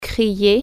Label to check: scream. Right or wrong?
Right